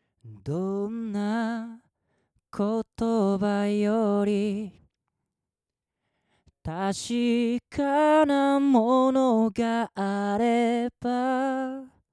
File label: SHURE SM87C